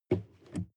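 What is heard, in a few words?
sports car interior pedal brake short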